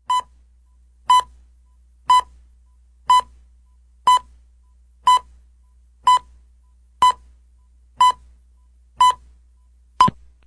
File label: Naval TimeStn